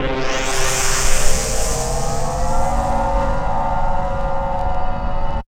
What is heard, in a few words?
Space Port; Jungle Warfare 1&2